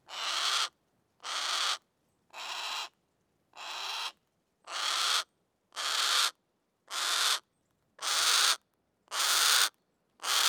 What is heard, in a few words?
Harris Hawk - Call, Squawk Shriek, Harsh; Schoeps MF; Heniek; Soundmind; Predatory Birds